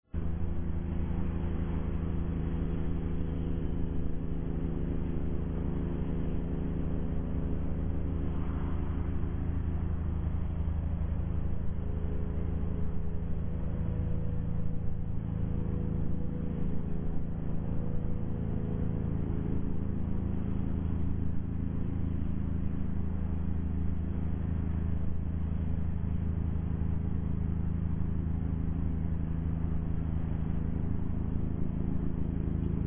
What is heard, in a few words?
Helicopter Aircraft; aircraft noise